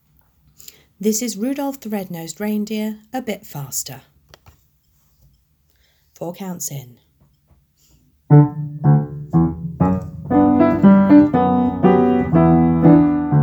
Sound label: 120bpm, cello